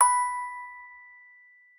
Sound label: musicbox